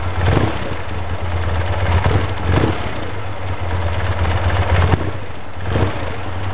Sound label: lean flip, Carburetor; Tune Up; Carburetor Air Cleaner; FCR; setting FCR